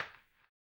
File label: HAMBONE; ILIO; Vocal Planet; Blues & Soul